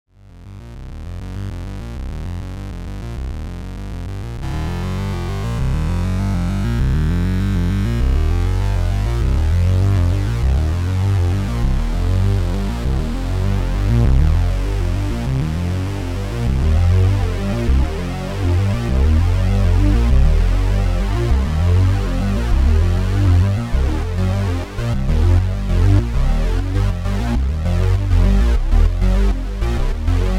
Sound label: Seq Unison Detune; jx3p upgrade